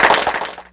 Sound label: Clapbox Pitch; Ableton Foundation FreePacks